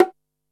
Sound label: KOMMERZDRUMS